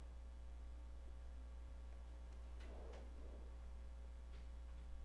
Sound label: harp